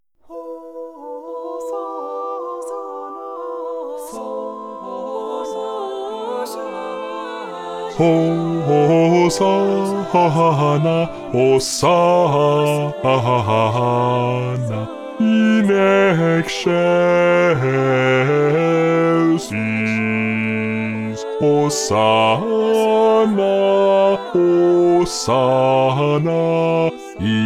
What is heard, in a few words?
BASS; Missa Mille Regretz